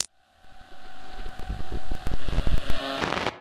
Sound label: interference; Polaris; misc